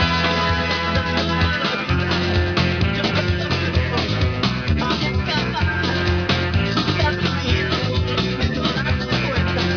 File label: music